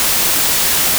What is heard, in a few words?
noise